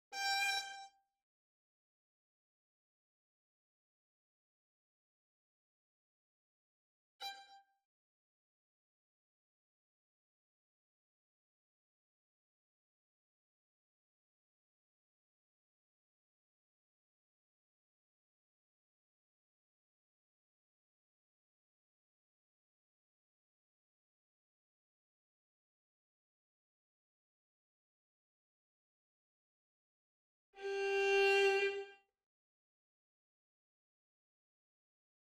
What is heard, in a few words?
Violin; Eine Klein Nachtmusik prepared for ctools by Shegolev